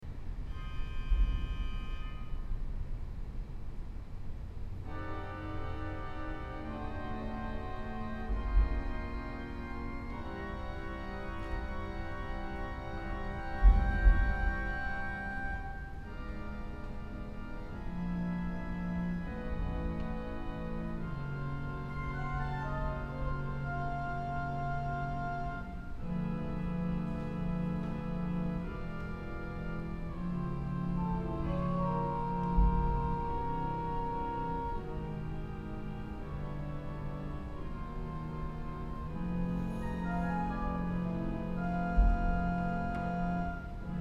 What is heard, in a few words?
Organ